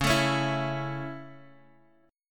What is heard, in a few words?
C sharp Minor Sharp 5th C sharp, chord; 6,9,9,7